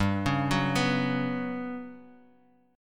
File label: G Major 7th Flat 5th, Bass Standard; arpeggiated; JGuitar